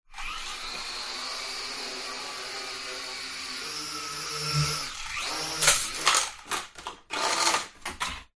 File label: Drone Falcon; fox audio